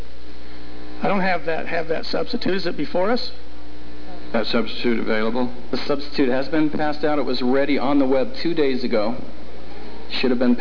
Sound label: Senate Laughter